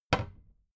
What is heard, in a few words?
cabinet door close regular